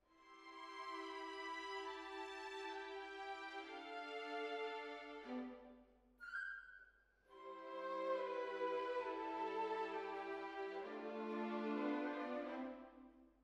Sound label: Hall, Jazz for piano